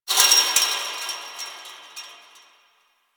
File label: Fence Rattle One Off; Warframe; Ambience; Nineteen Ninety Nine; DE